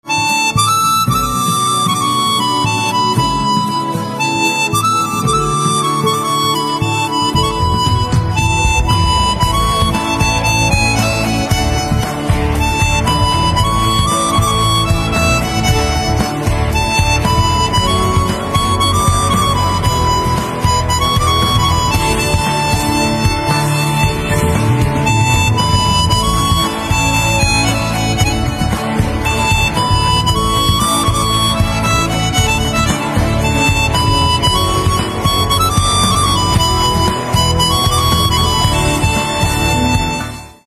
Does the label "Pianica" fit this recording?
Yes